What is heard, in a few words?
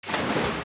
Water Land; Tektite; Zelda; OOT